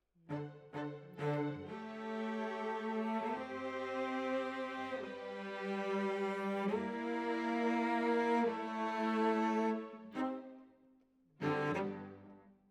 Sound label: Cellos